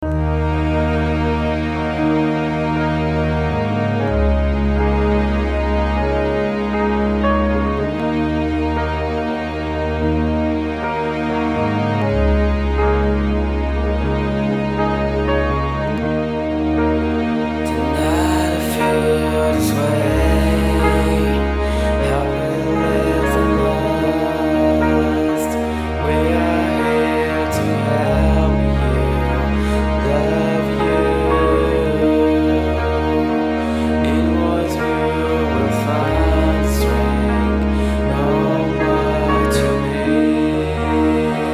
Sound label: strings version; ACTIVATE NOISE OFICIAL